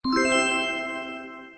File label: magic harp